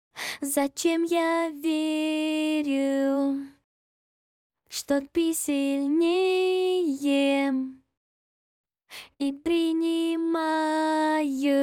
Акапелла

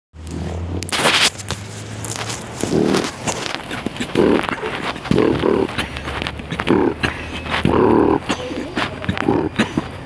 битбокс; перерыв между слотами

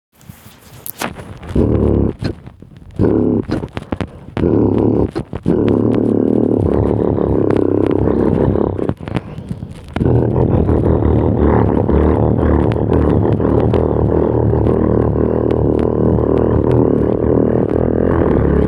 битбокс тема 2 (контактный способ записи)

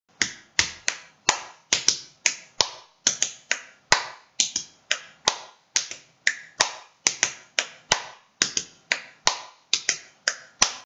88 bpm сэмпл стук, стук, щелчок, хлопок

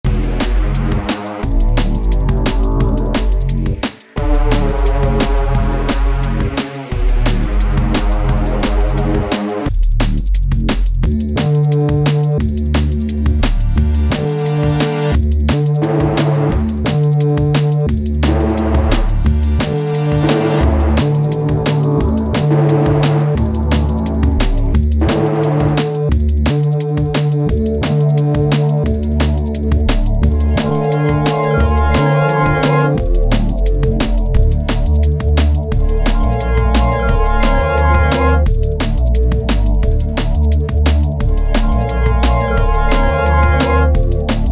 Минус Drum & Bass

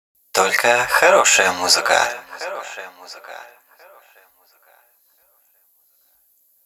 Джингл

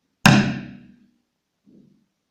Удар барабанной палочкой

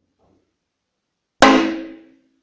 Удар барабанной палочкой

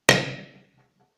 Удар барабанной палочкой

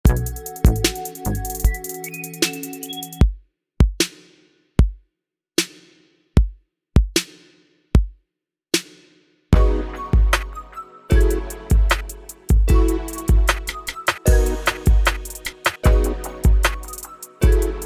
Минус Future Beat; Dmitrij Soundbox

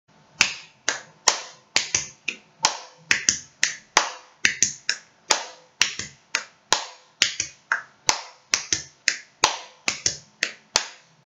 85 Bpm Стук, щелчок, хлопок, 2 стук, щелчок, хлопок

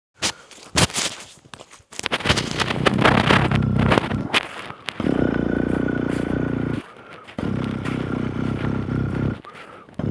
басслуп low

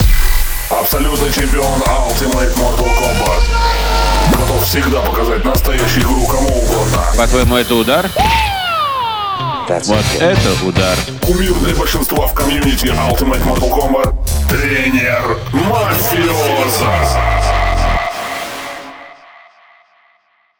Джингл

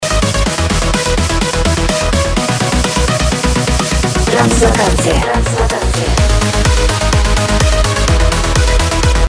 Звучание джингла на треке

